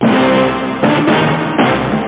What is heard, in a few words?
bambadambam